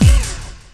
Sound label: DRUM STALL; E-MU Formula 4000 Series Vol. 2; Orbit Presets